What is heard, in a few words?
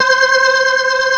C5, Keyboards Old School; USB Soundscan vol.09; AKAI; SMALL B3